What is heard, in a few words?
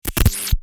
Short electrifying outburst electrical noise; sound design effects